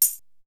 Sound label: Tambourine; ZERO G - Datafile DISC 1